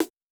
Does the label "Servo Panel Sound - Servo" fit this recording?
No